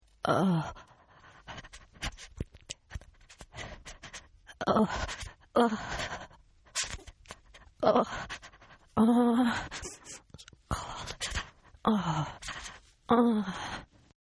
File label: Shiver Female Hypothermia; Sickness Sounds; Human